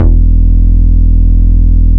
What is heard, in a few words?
Root E, Bass